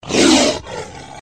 bonus lion hurt; slots; sRoma

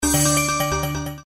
symbol highlight; slots; sRoma

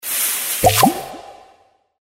multiplier explode; Candy Bona

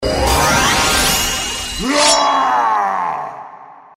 bonus enter; slots; sRoma

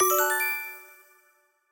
scatter triggering; Dolph Dive

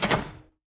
spin

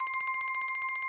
Win Incrementing; Dolph Dive

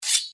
symbol slash; slots; sRoma

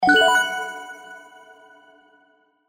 Wild, slots; Holy Goat

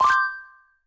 select claim

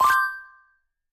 select claim

